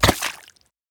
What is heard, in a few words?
mud impact bullet; physics; surfaces